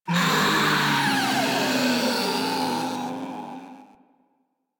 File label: Proto Infested Ancient Death, Enemies Vocals